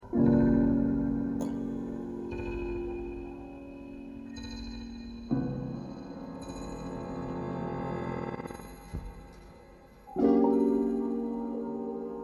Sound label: Improvisations; Montage